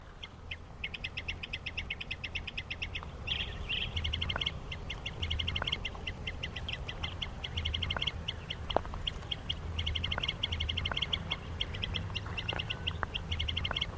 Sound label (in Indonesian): kirik kirik laut